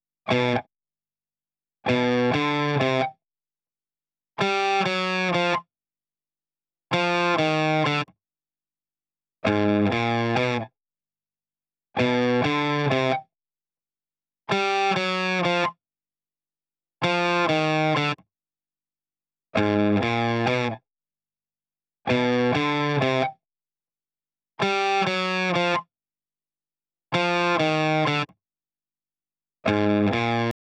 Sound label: DRIVE STEREO (Left)